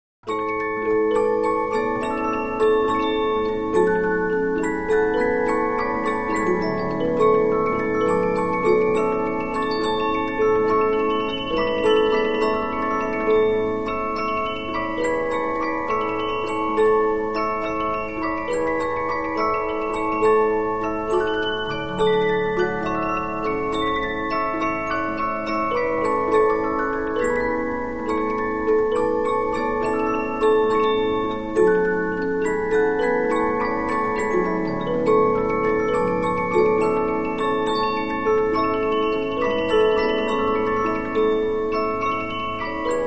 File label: Polyphon, musicbox 15inch